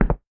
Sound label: Tile short